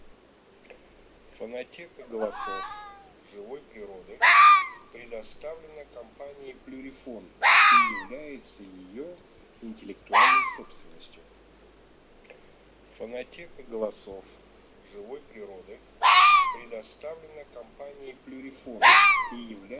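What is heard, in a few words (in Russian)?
Лиса Volpe amore, манок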